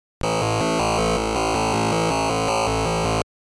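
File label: noise, LSDj Wave Synth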